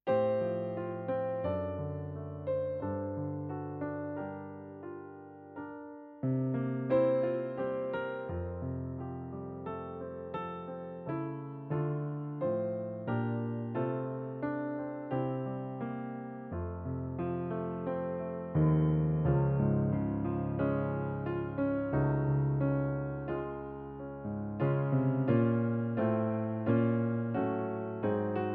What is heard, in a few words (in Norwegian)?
piano fra D; Deilig er jorden